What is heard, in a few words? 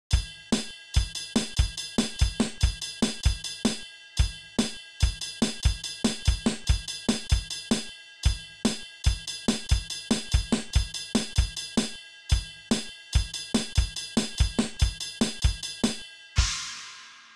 Drums 72 96 BPM